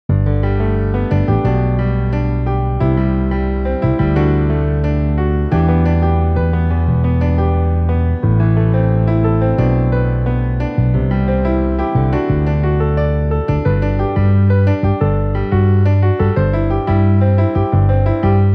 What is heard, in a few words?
Piano Bass MIDI